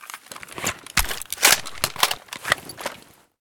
g28 reload, weapons

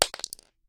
shell 9mm wood; STALKER; IWP

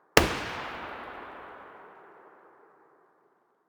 sig550 muzzle far; S.T.A.L.K.E.R.; IWP

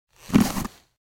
mask use; STALKER; IWP; item usage

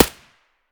aps silencer mono distant; STALKER; IWP

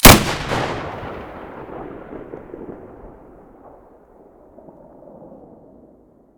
muzzle sv98 stereo

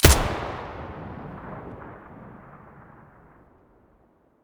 hk416 muzzle stereo

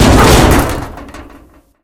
hit hard, barrel collide; STALKER; IWP

